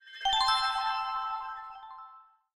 dungeon room cleared; Moonlighter

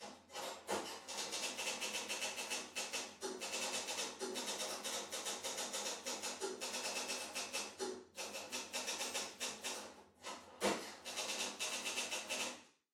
Deployment Ambience Typing; Prison Architect

